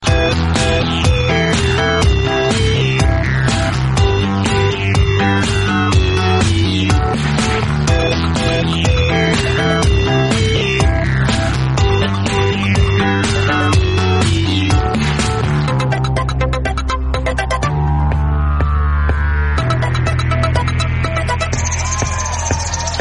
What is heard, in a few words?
electronica call notification tone; samsung ringtones